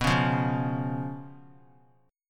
B Suspended 2nd Flat 5th B; strummed; JGuitar

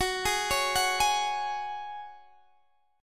F sharp Suspended 2nd, Guitar, Open D; down

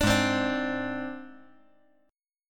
G sharp Major Flat 5th, chord; G#Mb5 strummed; JGuitar

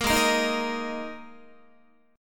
Ab Suspended 2nd Ab down Guitar; strummed; JGuitar

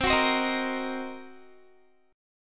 C Minor 7th Flat 5th, C, Guitar; strummed; Cm7b5; 20,21,22,20,22,x; 4E,4A,5D,5G,5C,6F

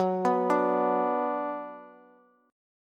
Gb Suspended 4th Sharp 5th Gb, down, Bass; arpeggiated; JGuitar

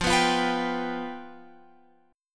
F Suspended 2nd Flat 5th, Guitar, Open F; strummed; x,12,11,12,14,14; JGuitar

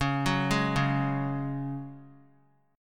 C sharp Suspended 4th; arpeggiated; JGuitar

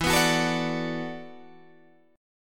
E 7th Suspended 2nd Suspended 4th, Guitar, DADGAD

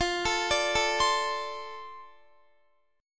F 6th F down Guitar; F6 arpeggiated

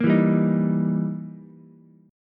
E Minor Major 7th Flat 5th, E, down, Ukulele; strummed; JGuitar

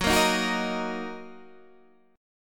Eb Minor F sharp, Ebm/F# Chord; Ebm/F# strummed; JGuitar

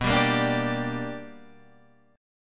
B Minor Major 7th Sharp 5th, chord

